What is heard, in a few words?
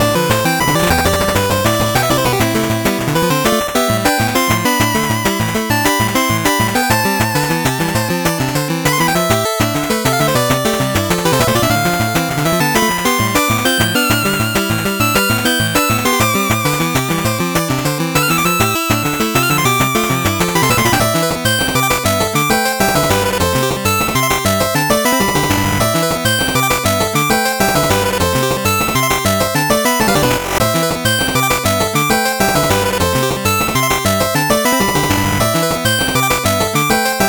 8bit bgm fast